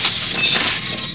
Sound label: bed squeak; ambience